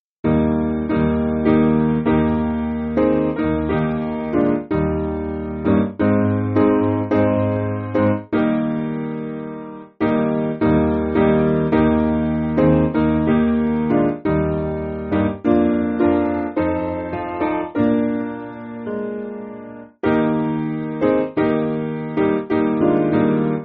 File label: Piano, Backing Files for Churches